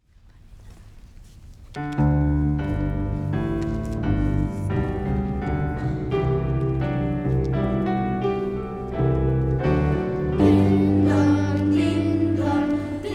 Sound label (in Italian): Campane di Natale tradizionale per coro adulti e partecipazione voci bianche; San Tomaso